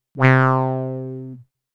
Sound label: Wah, synthlead